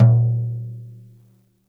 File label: floor tom - snares off; JazzFunkKit